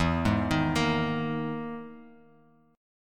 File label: E-Major Sharp 11th E, Bass Standard; EM#11 arpeggiated; JGuitar